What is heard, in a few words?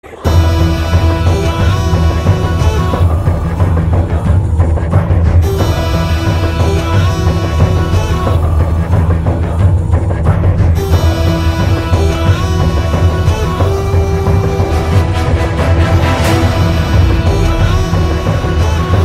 ringtone; Devara Part 1